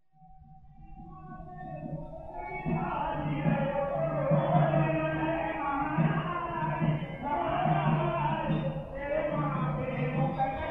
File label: group of pilgrims; radhakund